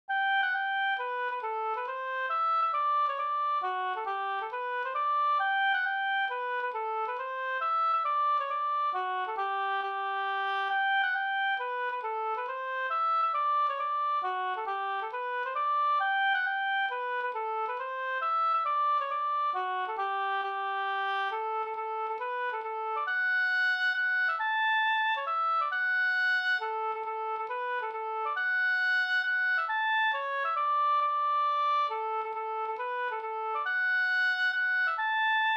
hambopolska

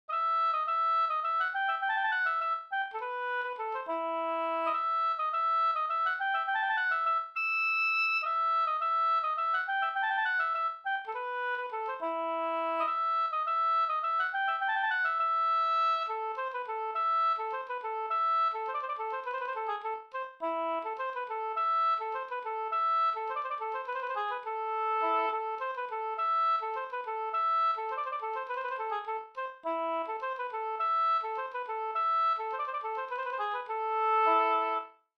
halling; e Martinus Amundsen nr3 e Mats Berglund

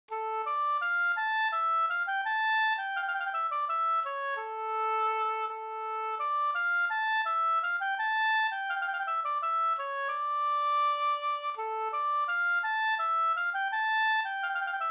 skanklat

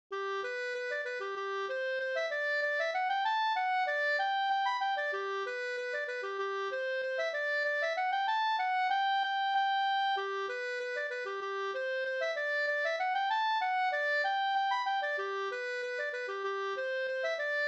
polka slunga; e Daniels Anders o CE Norman Da

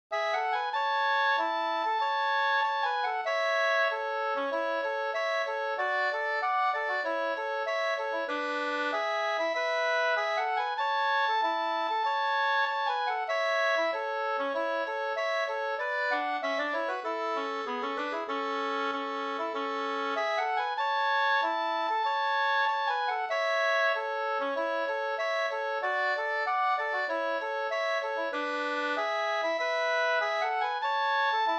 brudmarsch A-dur; fr Gudbrandsdalen

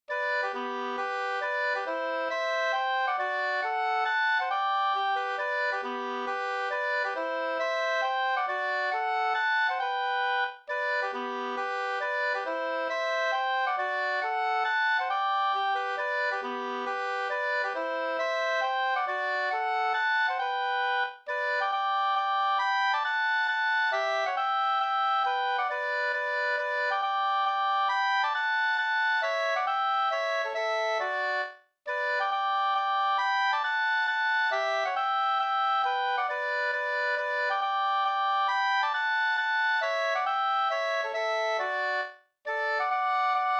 mazurka; Lunkaren Lotlax Vora fi 2st

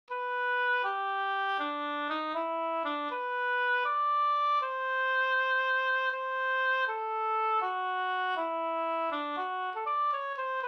folkdans vals; Sankt Bernhards vals

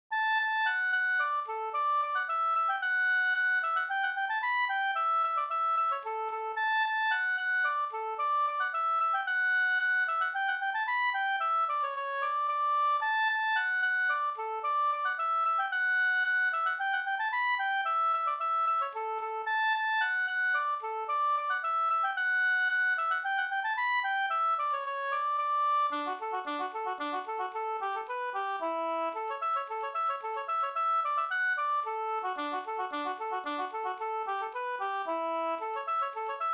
slangpolska

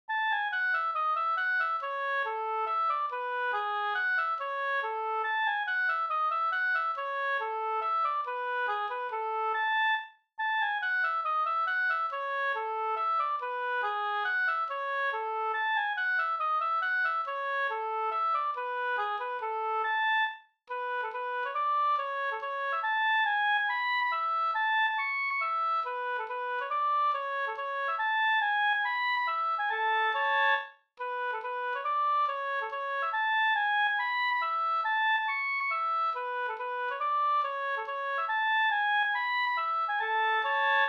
hambopolska

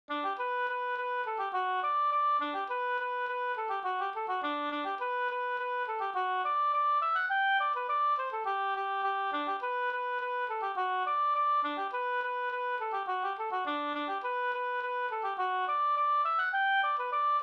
engelska; ur Lofgrens saml; Stockholm

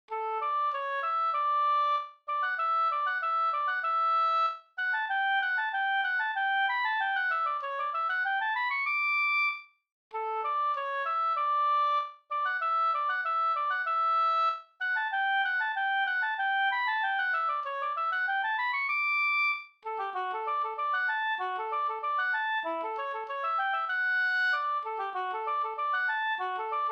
folkdans kadrilj D-dur

